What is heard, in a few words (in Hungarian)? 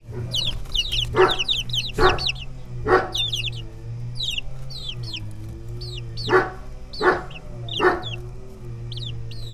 kutya fűnyíró, csibe magyar tyúk; állathangok; paraszt udvar